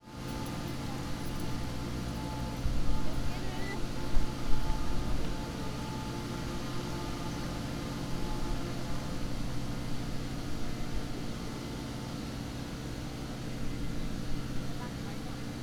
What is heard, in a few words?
fukushima station decontamination; ekimae